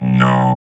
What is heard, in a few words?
VOX VOX, Electro Vox; Sound & Vision; Gigapack II CD 2 (Roland)